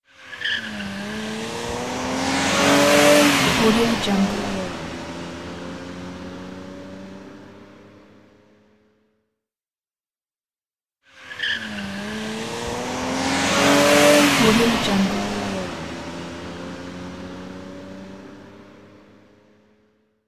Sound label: turbo car leaves away with squeal of tires; soundfoodsfx